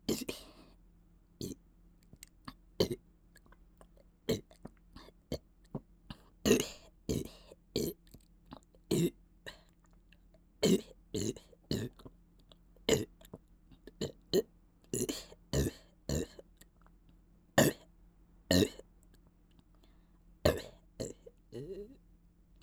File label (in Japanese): ゲップ祭りゲップのみ